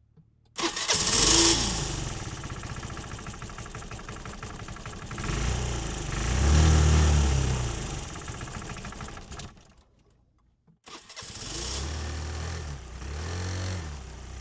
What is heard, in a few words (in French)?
Piaggio GTS 125 Contact ralenti et cale Pot G Moteur